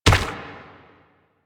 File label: Proto Infested Runner Step Default; Warframe; Steps